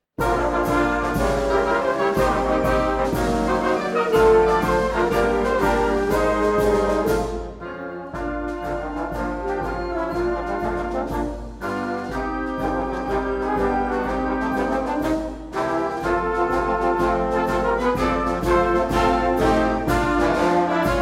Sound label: keys and swing